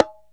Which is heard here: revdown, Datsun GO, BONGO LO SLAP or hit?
BONGO LO SLAP